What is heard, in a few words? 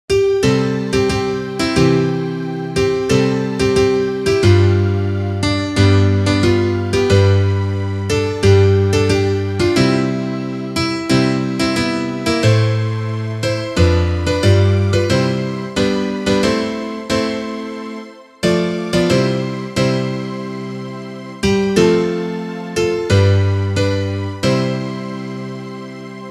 instrumental